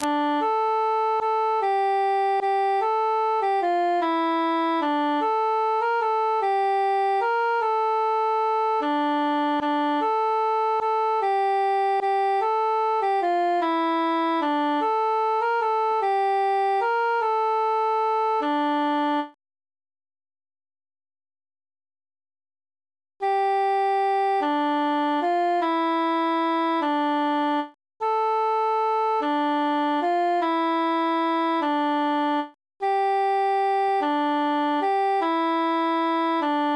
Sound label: three country dances transposed altus solo